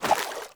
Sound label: Grunt Step Water, footsteps; Enemy; Characters; Corrupted Sproutfolk